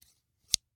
Scissors, snap, snip, shear, large, single; Eiravaein Works; Vaeyan IV

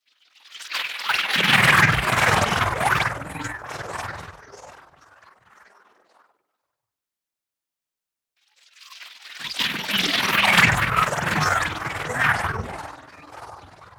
NEON WHOOSH SMALL SLOW Swirling Projectiles; boom library

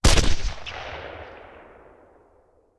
Machine Gun; Sound Ideas; Guns

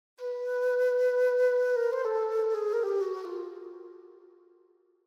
Flute Short Light Flute Melody

